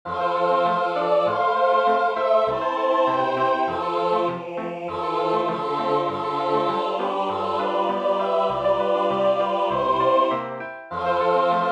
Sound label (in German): Zigeunerlieder Tutti